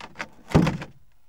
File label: BOX RATTL; Ambient Sounds; Box Noises; E-MU Producer Series Vol. 3; Hollywood Sound Effects